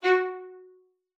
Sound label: VIOLIN SPICC, F#4; Xsample VOL-5